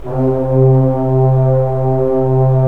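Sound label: brass trombones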